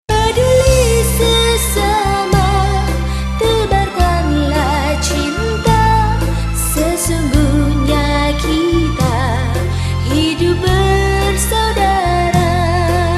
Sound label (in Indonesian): Melodi Kehidupan